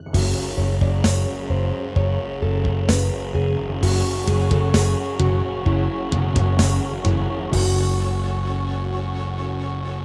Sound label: Yamaha DD-55; Doom (Sigil); Introduction